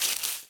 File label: leaves step; Golden Crayon Sounds